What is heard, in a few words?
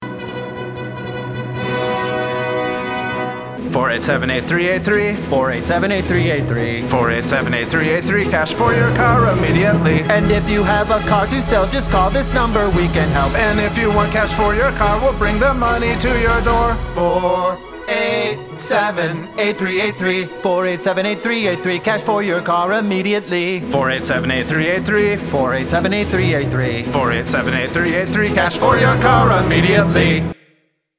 jingle; Cash For Cars